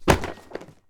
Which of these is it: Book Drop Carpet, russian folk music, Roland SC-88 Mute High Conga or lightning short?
Book Drop Carpet